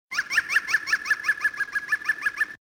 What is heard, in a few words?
Green Woodpecker